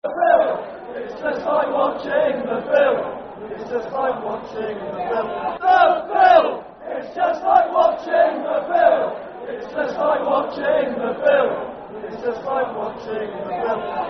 Football Chant (Soccer Song), Brighton; Just Like Watchinng The Bill; Brighton Bhafc

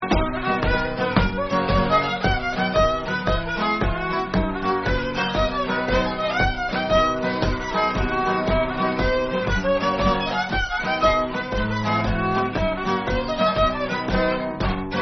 6 8 C; Nameless Tune

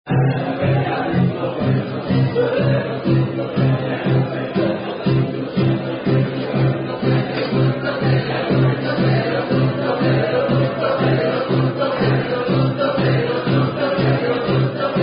Down-Down Song; Punishment Ad Pistum Cantus Best Graz; Unknown Spanish Down-Down Song

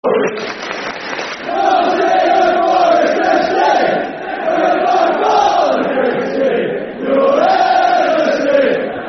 Football Chant; Nottingham Forest Fc; Nottingham Forest Nffc

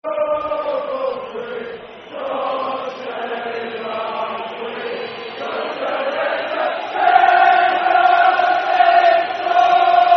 God Save The Queen, Football Chant (Soccer Song); England

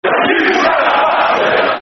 Football Chant (Soccer Song), Man United; You Scouse Bastards

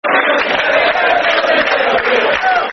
Football Chant; Yossi Benayoun; West Ham WHFC; fanchants

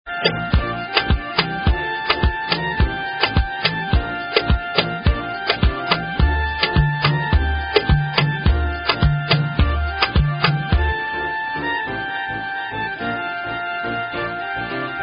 Dub

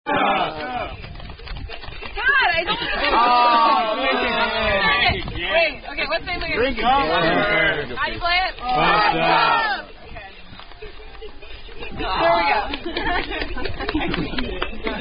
Drinking Game; Thumper; Signs Indian Drinking Game

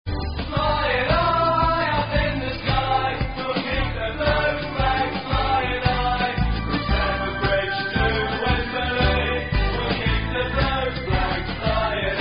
Song

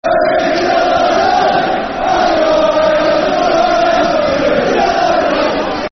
Bury Til I Die Football Chant; Bury Bfc

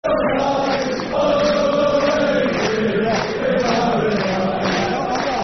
Another Wednesday, Football Chants; Sheffield Wednesday Swfc

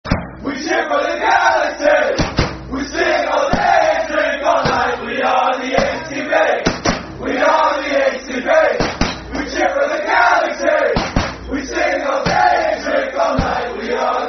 A Group Of Chants Football Chant (Soccer Song); fanchants; Los Angeles Galaxy Lafc

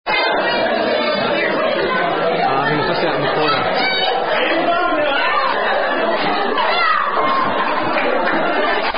Non-Drinking Game Holds Sticks And Spin; Dizzy Stick; Cb Youth Group

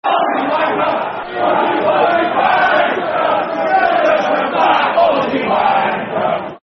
Football Chants (Soccer Song); Fat Aussie Wanker; Tottenham Hotspur Thfc

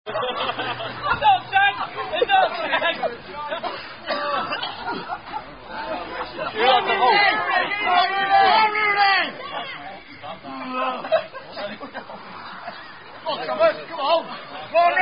Drinking Game, Spinning Around Cricket Wicket; Bacup Cricket Club; Dizzy Stick

